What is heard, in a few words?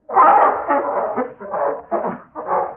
Giant Chicken